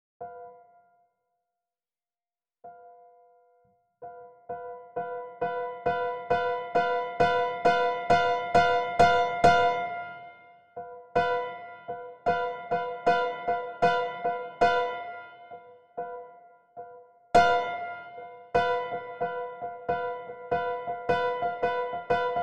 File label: loudest and softest notes reconciled